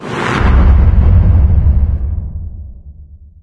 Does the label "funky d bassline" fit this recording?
No